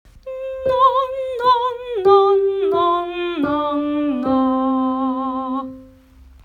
Na で ドシソファレド

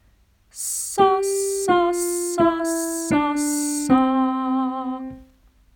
Sa ソファミレド

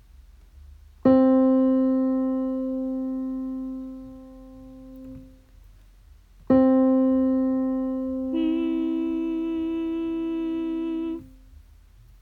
ドを弾きミを歌う

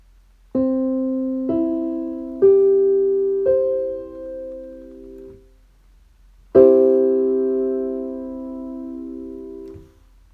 和音ドミソド

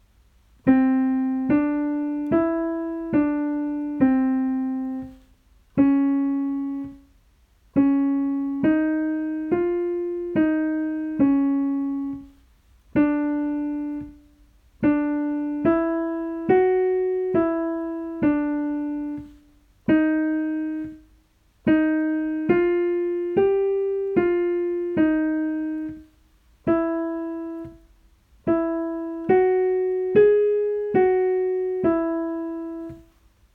ドレミレド 音階

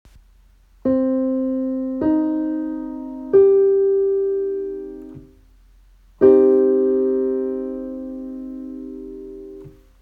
和音ドミ♭ソ

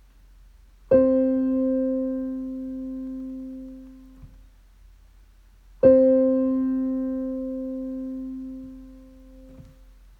和音 ド ド

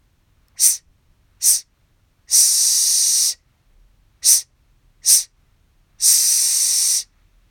子音S短い息も入れる

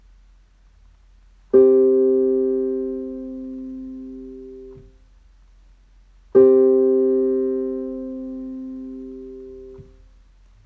和音 ド ソ

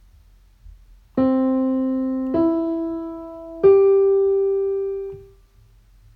ドミソ

